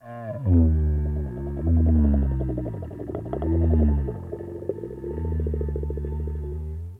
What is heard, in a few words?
Venus Landscape Mushroom Creak; Ambience; Warframe; Venus Open World